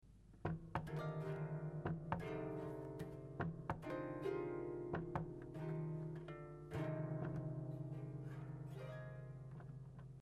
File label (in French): mix, harpe; chiffon